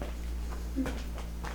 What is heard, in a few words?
hum